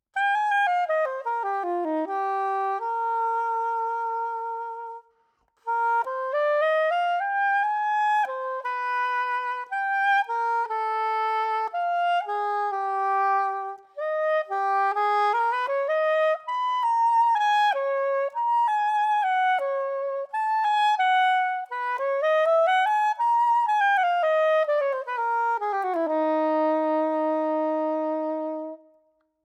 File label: SOPRANO TIPPED BELL, sax; timbri